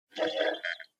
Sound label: Portable CD Driving Noise Seek